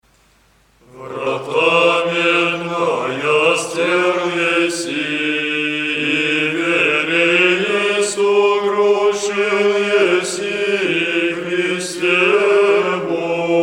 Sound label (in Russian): Стихира воззвашная; Врата́ ме́дная сте́рл еси́